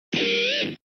censored pinch harmonic; metalocalypse; dethphone

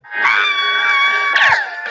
screaming

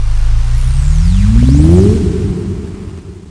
attack charge; touhou

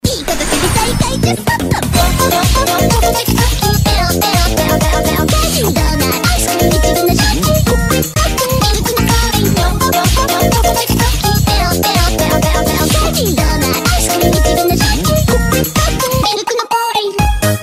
ice cream song

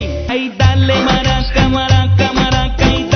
maraca; dale

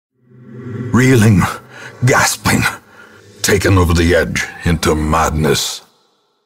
reeling gasping

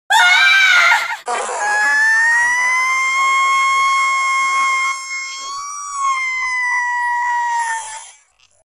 female scream

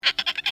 squeak

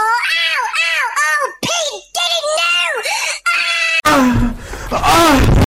uuuuuuuuuuuuuuuuhhhhhhhhhhhhhhhhhhh

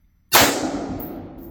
556 nato firing

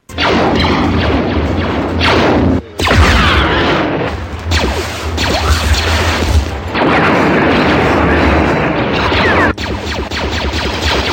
blasters

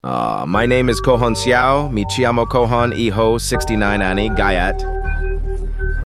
uuuhhh

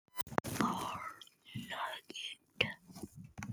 fart

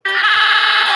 laughing man; pleasantries

